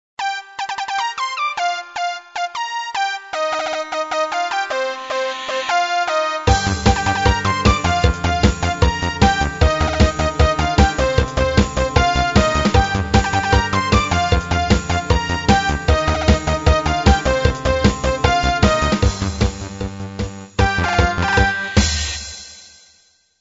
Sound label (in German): Ratte Smash Ringtone; Jamba